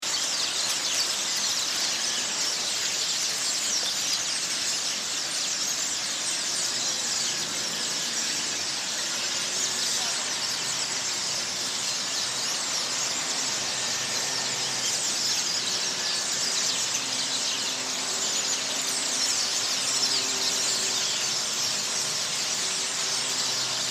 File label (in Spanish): Voces en dormidero tordo renegrido; aves